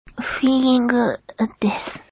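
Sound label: guitar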